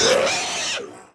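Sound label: MON INSECT ATTACK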